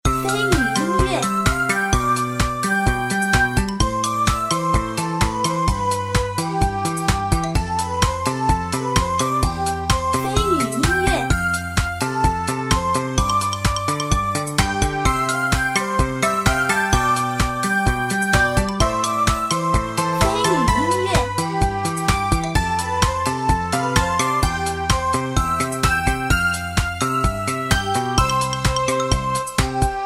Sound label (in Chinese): q版-休闲-轻快